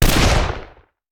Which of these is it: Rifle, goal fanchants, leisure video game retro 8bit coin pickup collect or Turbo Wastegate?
Rifle